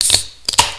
beer

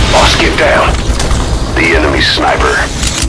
sniper

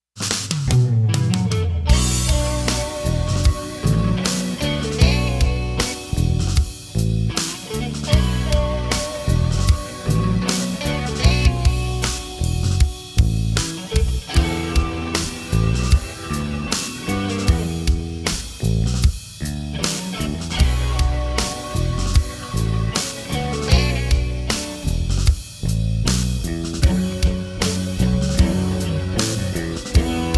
Bad Luck Blues

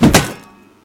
repair metal

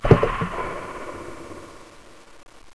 big stop

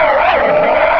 windup

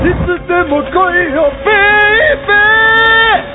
alert; votemap; overflow

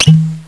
plop

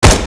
AR fire; dahl; hyperion

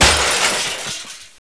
bust glass

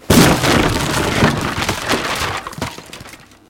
break wood

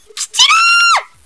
win

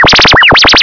activate, probe

